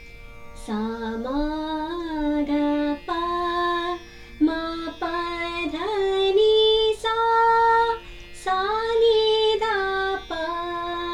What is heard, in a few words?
Kedar Aroh